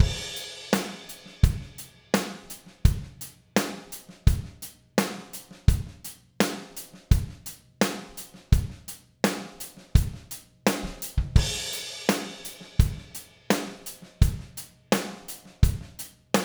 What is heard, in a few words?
verse, hammond riff